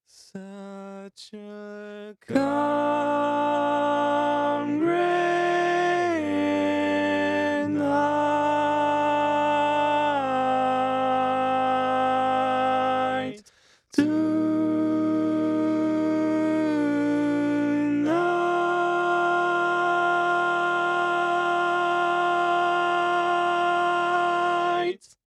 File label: Tenor, barbershop